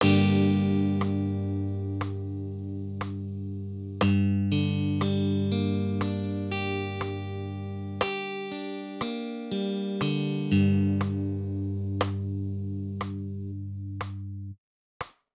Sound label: Gm rhythm